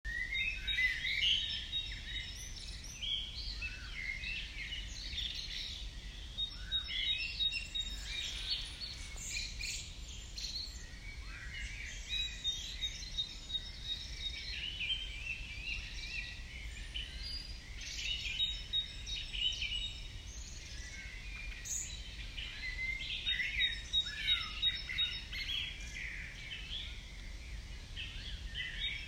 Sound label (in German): Vogelkonzert